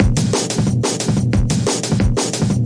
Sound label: 180, DRUM LOOPS and BREAKS; 161 to 180 bpm; da bonna skunk